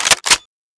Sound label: ksg12 pump